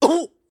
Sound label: Stun Out; Collector